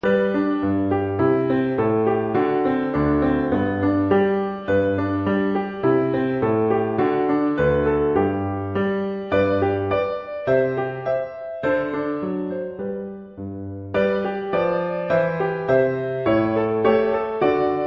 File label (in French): piano danse